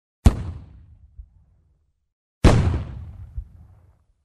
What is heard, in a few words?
Grenade explosion; explosions